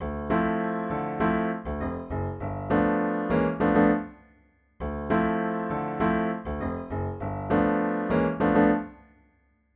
funk 2 5 segment

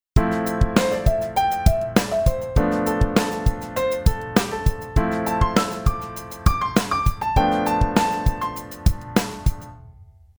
funk improvisation

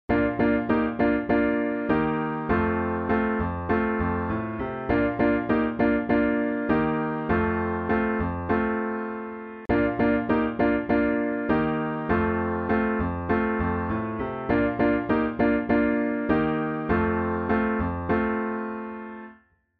rock piano chords

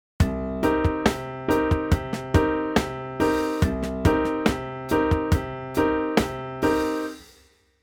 funk piano rhythm chords

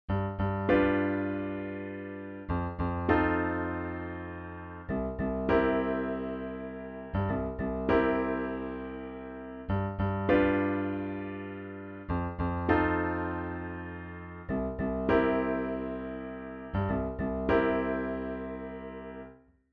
dominant 7 example, piano

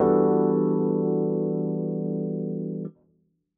e minor 11 neo soul piano chord; neo soul chords for the piano; jazz

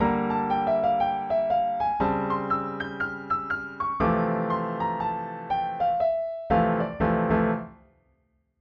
jazz piano 7 3 6 or minor 2 5 1 lick

